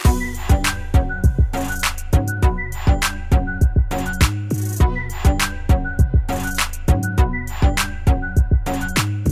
trap instrumental

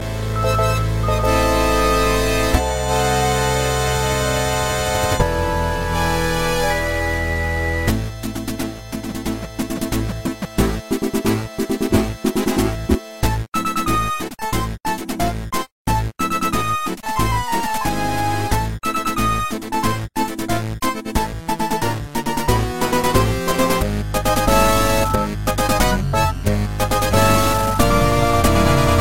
Theme 8 Bit

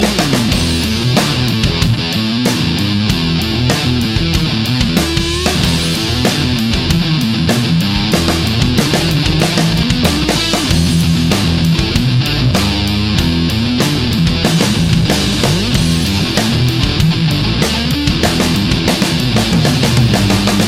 guitar impro, rock; ringtones

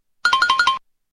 cartoon wingle; ringtones; sms

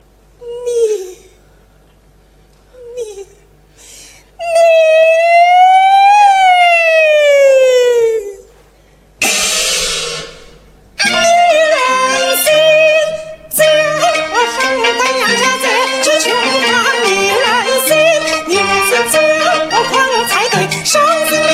Beijing opera

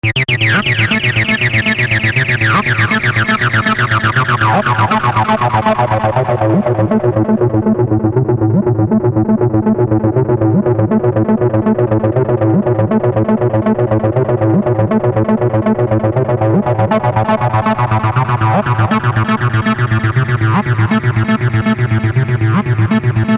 303 acid

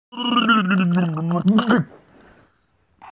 Blub Blub

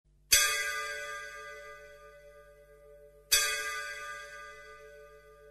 temple bells sms

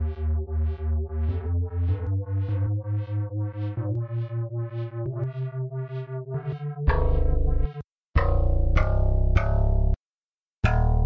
E flat descending

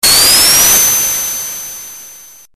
Turbo Spin; Sonic

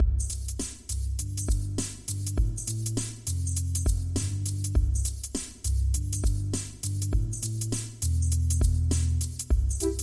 Baby, midi

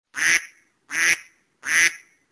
duck; iphone

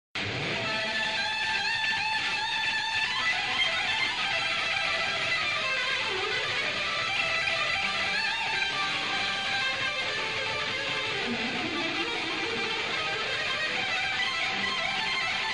mega guitar solo

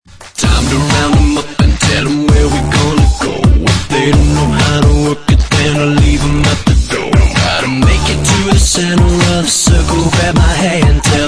Beat drop

